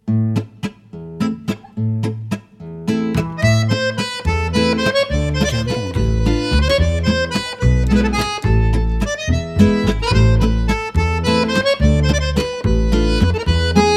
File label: French Romantic